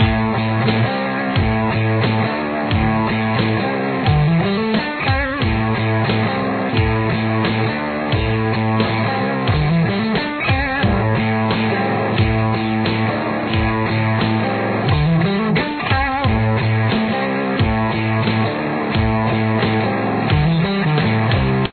chorus, guitar

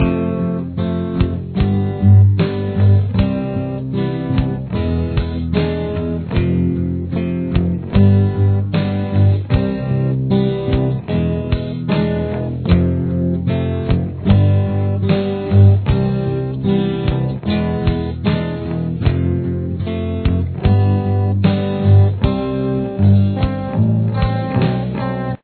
bridge mix down

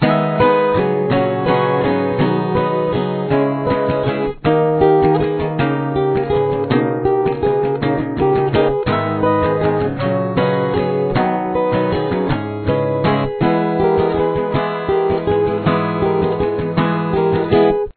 outro full, guitar

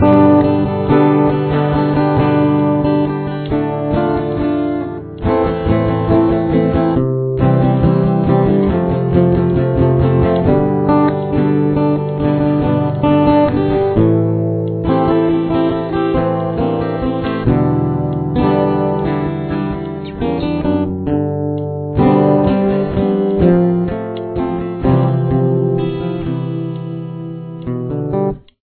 chorus, guitar

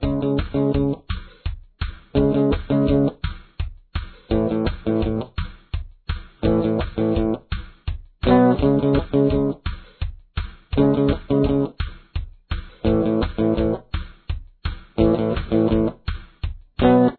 verse guitar

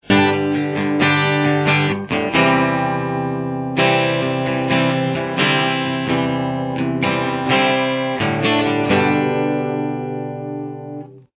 theme, guitar; Intro; G, C, and Dsus2 chords; Below is an easy way to play it.; The song fluctuates between the intro and verse's G, C, and Dsus2 chords.

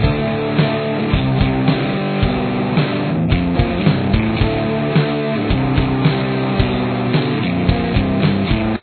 verse guitar

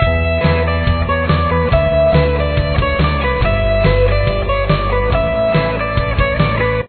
pre chorus, guitar

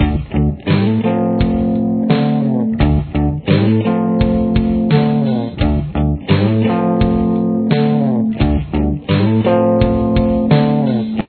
Main Riff, guitar

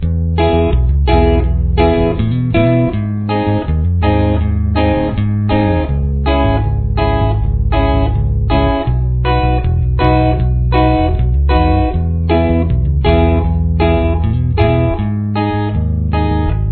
full verse; ska-punk

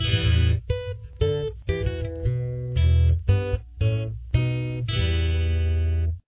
intro, guitar

